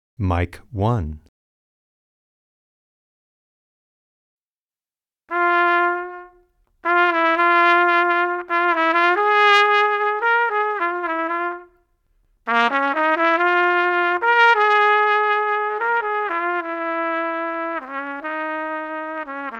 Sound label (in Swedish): Trumpet